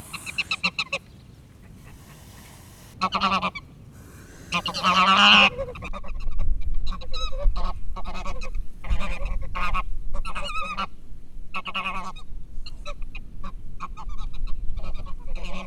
sziszegő gúnár szeles idő, fodrostollú magyar lúd; Hortobágyi Nemzeti Park 2013

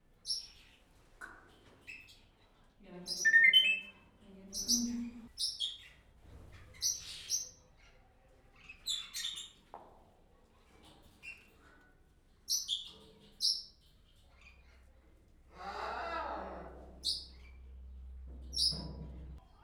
kétszer másféle, jákópapagáj; pecsi zoo 2017

